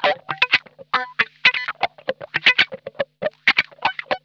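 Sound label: COARSE SCRAPE, Funk Guitar; Zero G - Funk Guitar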